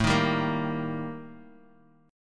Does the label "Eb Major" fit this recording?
Yes